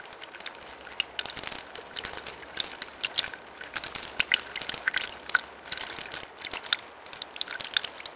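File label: waterfall trickle loop; water splashes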